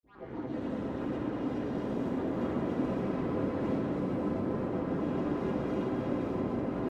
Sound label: Underwater Techy Ambiance; pad